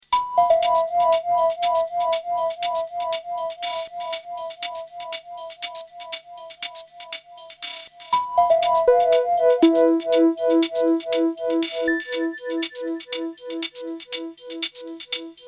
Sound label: digitone